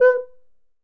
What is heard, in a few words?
Cuica High; Latin Percussion; Drums; Drum Hits; Ableton; Factory Packs